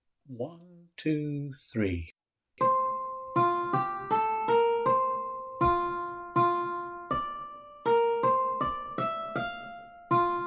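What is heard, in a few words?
Two Minuets - Uke 1 Practice, ukulele ensemble; JS Bach; brewkulele ensemble 2022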